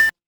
retro game coin

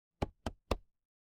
impact wood knock

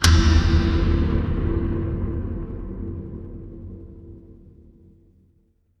horror stinger

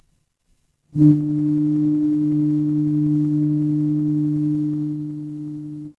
fire electric hum

